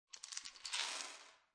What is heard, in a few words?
Pills Spilled On Counter Small, Foley, Pills Spilled On Counter Top, Small Pills; Clicks